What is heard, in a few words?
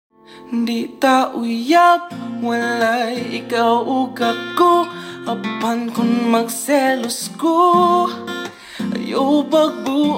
Hahahahasula